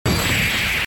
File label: ko effect gain; GameJam-polytech